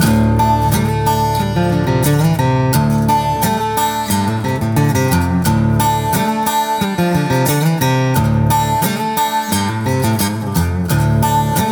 encounter country person; Manamon Music; Manamon 2

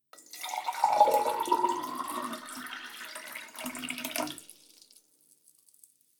pouring; Golden Crayon Sounds

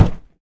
wall cardboard; Golden Crayon Sounds

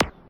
menu enter; Golden Crayon Sounds

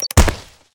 remote timebomb place; Original TK Sounds

